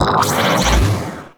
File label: Magnetize; Warframe